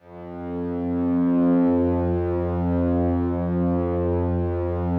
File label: slow strings F1, Yamaha VP-1; 90 s Sample CDs; Music Store